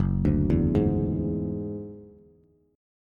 Gb 7th Suspended 2nd Sharp 5th, Bass, Bass Standard; arpeggiated; JGuitar